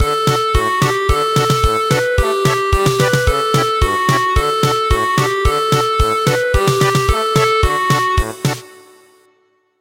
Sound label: German Polka